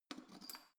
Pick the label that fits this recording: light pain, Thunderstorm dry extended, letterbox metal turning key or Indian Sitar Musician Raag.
letterbox metal turning key